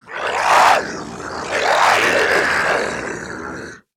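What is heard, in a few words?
idle, zombie male; nmr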